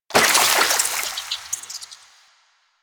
Infested Flower Burst; Warframe; Ambience; Nineteen Ninety Nine

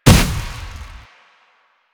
Updated Shot, Weapons; Warframe; Moa Pet; Cryotra

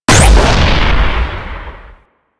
Antimatter Null Star Fire; Warframe; Warframes

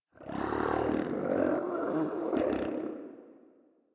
Catbrow Combat Vocal Idle; Warframe

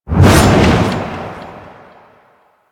Hover Attack; Enemies; Deco Hover Attack Base; Warframe; Warframes; Pax Duviricus

